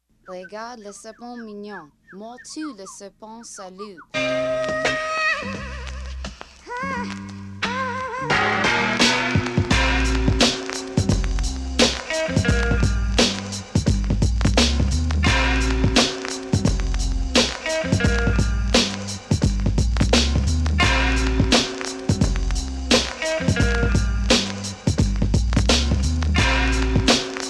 instrumental

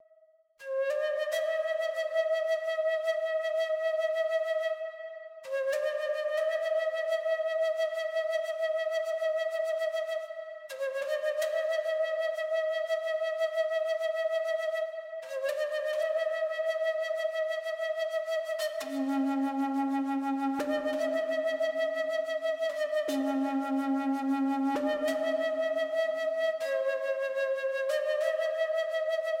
native american flute

